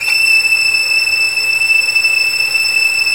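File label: violin section, Arco Violins; E-MU Producer Series Vol. 2; More Studio Essentials; Composer; Arco Violins III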